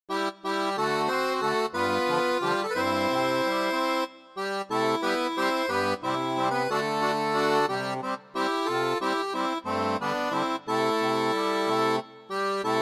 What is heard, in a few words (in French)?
seraphone, orgue barbarie